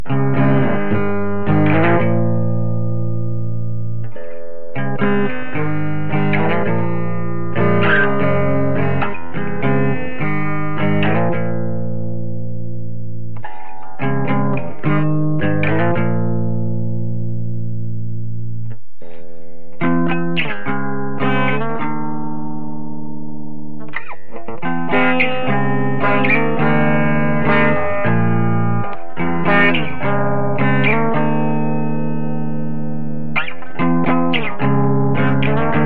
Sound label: original guitar song